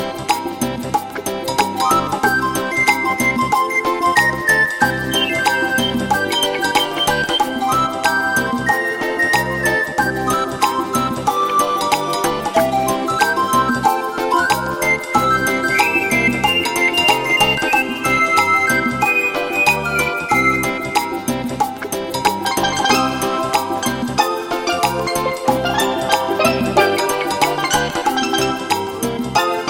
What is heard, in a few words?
Reggae is Life